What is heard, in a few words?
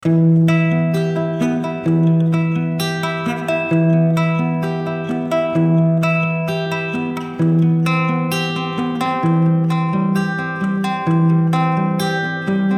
melody